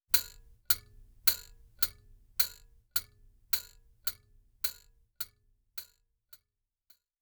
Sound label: clock rhythmic